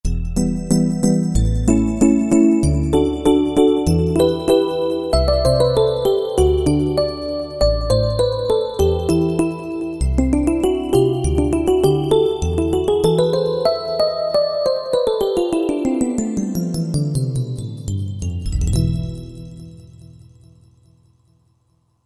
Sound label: Lite Delay, Roland JD-990; Factory Patches